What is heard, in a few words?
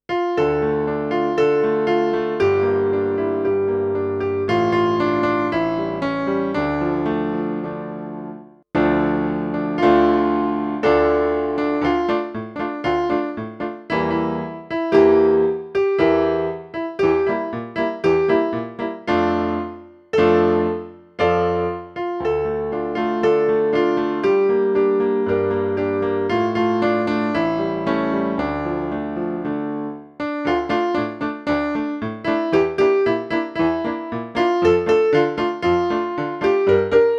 instrumental